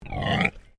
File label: Animal Pig Landrace Grunt